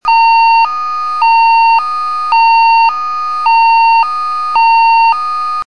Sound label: motorola skyfire